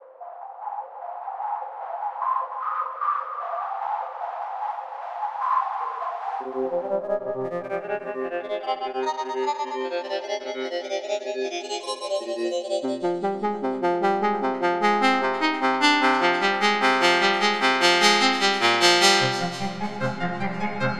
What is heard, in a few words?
synths